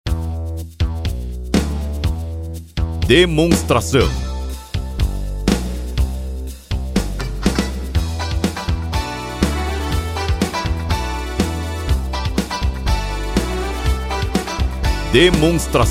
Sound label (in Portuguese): Trilha